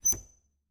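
Refrigerator, old fashioned, wooden, steel, brass clasps, hinges, open; Eiravaein Works; Vaeyan IV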